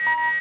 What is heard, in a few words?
fed beep access; interface